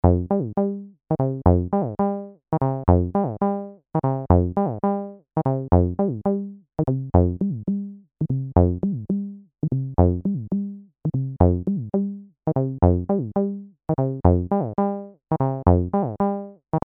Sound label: TD 3 Normal Decay